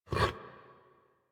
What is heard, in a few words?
Tame Idle Alert Turn; Enemies; Warframe